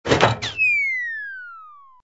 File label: canned toss up only; Toontown Online